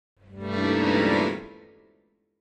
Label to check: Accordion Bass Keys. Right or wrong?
Right